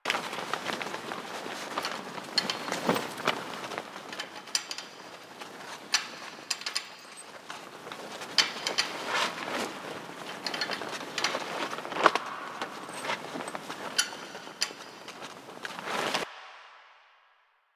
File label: Tarp Rustle Loop; Warframe; Ambience; Nineteen Ninety Nine